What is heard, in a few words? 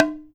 OKAWA, JAPAN PERC; AKAI S6000 CD-ROM; WorldSounds